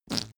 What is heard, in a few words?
whoopee cushion fart short